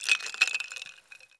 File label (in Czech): led; Mafie 1